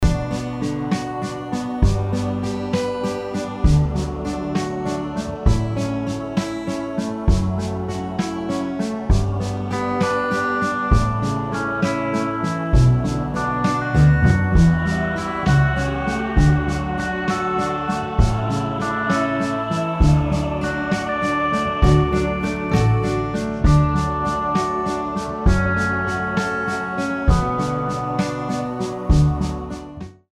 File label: Karaoke Song